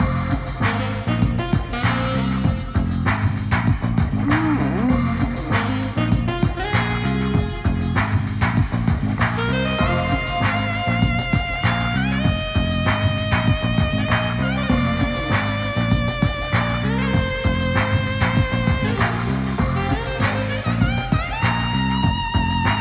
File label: freespin melody; Grand Casanova